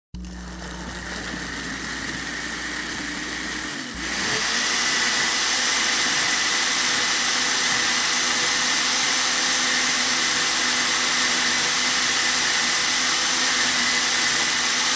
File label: Blender; SOUND EFFECTS1